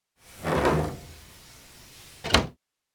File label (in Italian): finestra Open Close; Inquisitor 3